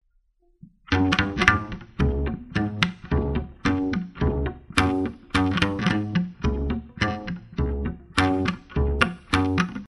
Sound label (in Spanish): Bajo tina